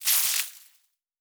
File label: Spark, Electric